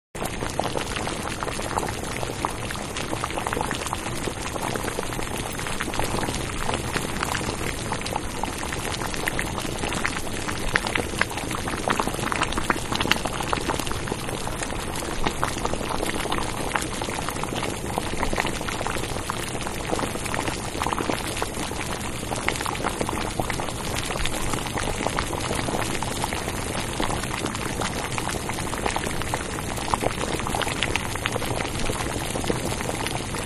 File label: Water Boiling In Deep Pan; Food Prep; Restaurants; Manhattan Production Music